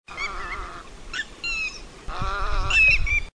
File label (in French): aigrette garzette, chant